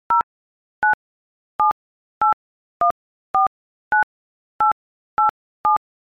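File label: iphone keyboard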